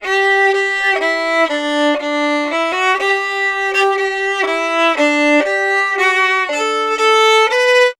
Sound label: Fiddle, Appalachian